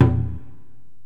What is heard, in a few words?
Korg x5, Drums